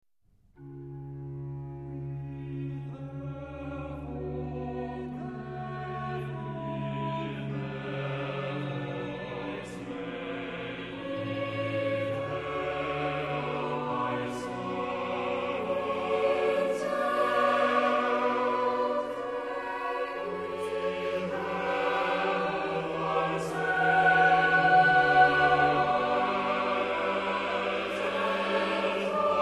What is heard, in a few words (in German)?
tedeum Chor